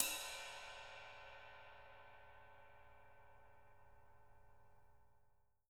rides

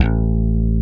A 1 BASS F

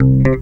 CLAV BEL; USB Soundscan vol.32; Roots Disco; AKAI

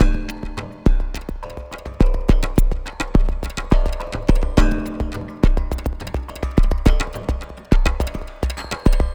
Terrestrial Hybrid, Processed Percussion

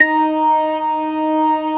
ORGAN, C3 PERC; Keith Emerson Signature Series

